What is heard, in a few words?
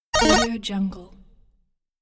retro game damage hit; happymusichappysounds